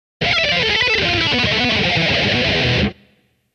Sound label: Descending Harmonic Minor Run; Mick Thomson